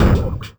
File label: Spitter Hit, Esoterik Beatz; Spitter Kit